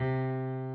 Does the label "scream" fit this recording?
No